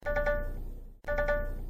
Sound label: error